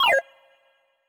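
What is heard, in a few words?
sys Cancel; WolfRPGEditor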